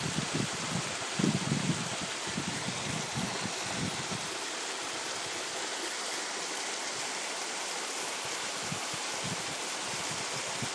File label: waterfall